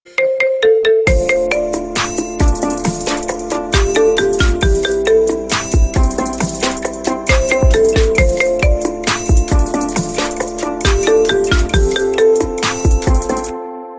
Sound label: marimba